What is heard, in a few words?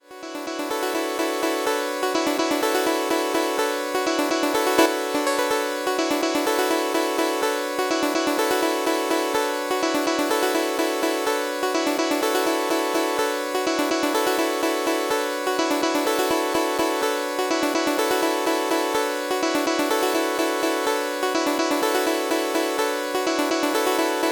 Remixer; 1010music; fxbox